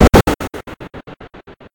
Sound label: beam start; osu